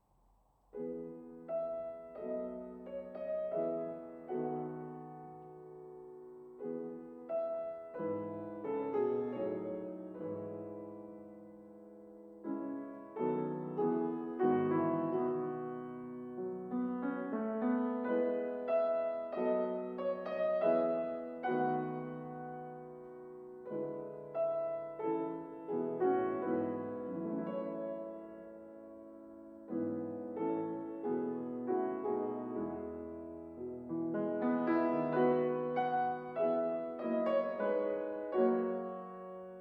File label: Piano Trio; tchaikovsky piano trio in a minor op 50